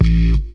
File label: tone kick; Kicks; single hits